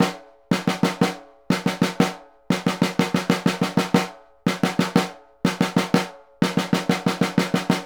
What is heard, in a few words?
SNR, Synclavier Percussion 2 - World & Orchestral